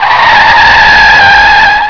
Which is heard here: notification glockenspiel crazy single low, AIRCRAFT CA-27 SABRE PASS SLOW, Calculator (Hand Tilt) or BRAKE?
BRAKE